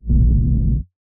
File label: TECHNO RUMBLE TAILS; TECHNO PARADISE